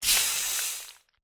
cautery, Surgery; Medical

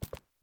tile, Footsteps; civ13; civ14; Civ Effects

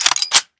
garand magin; Weapons; Guns

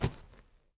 asteroid, Footsteps

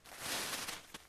snow, footsteps

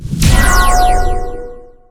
staff animation; Magic

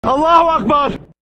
charge, Voices Emotes

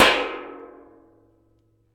tray hit; civ13; civ14; Items

